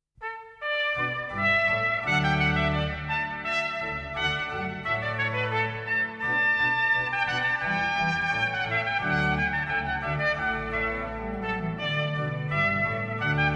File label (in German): Klingelton Tromp Org